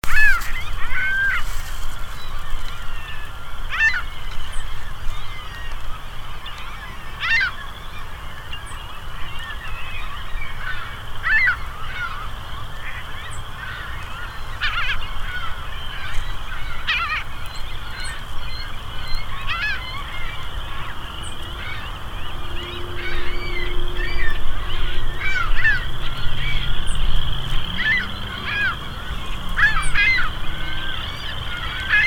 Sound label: ring billed